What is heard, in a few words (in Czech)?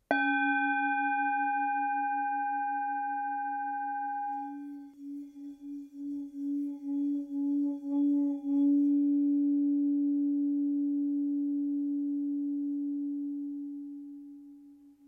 tibetska misa